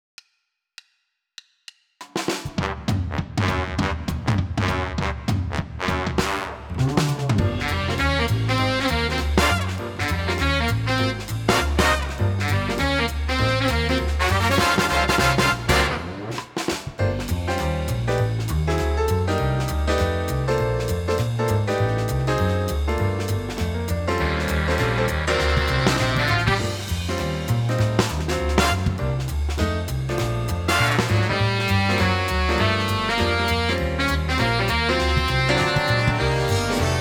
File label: jazz; vocaloid-contest 2013